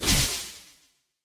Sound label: wp vanish dist; Original TK Sounds